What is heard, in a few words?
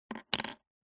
foley token plastic set down wood